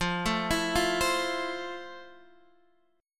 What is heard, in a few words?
F Major 7th F, Guitar, Standard; arpeggiated; JGuitar